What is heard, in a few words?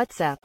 Whatsapp, ringetone; Whatsapp Assistant; mobiltelefon